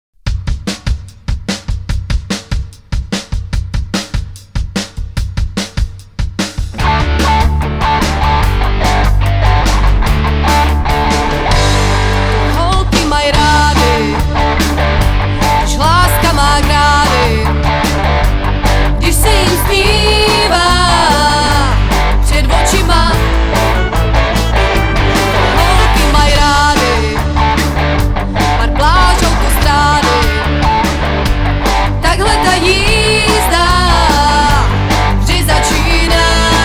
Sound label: orchestra